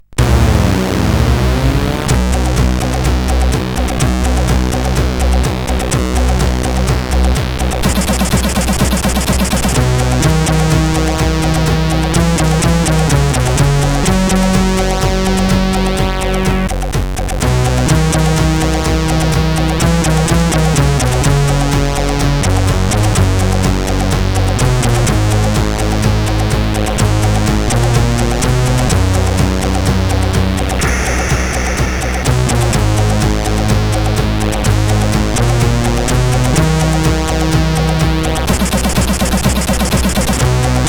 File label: Rough Theme, sid CSG8580R5